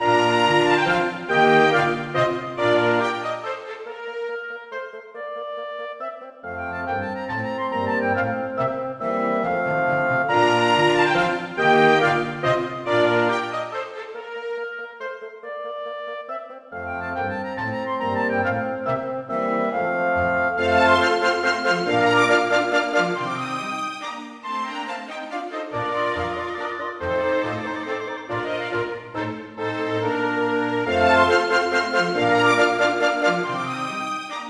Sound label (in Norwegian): Menuet for kammerorkester